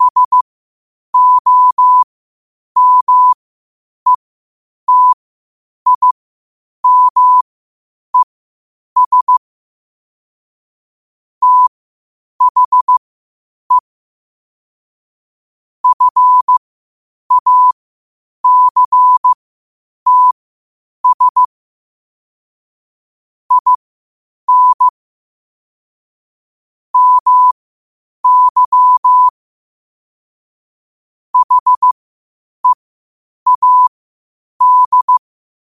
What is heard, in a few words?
5 wpm, morse